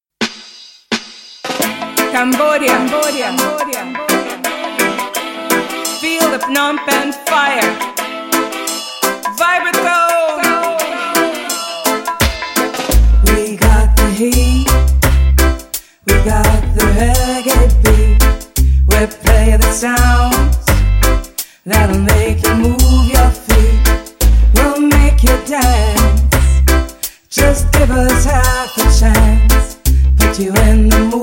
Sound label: Reggae; Phnom Penh; Vibratone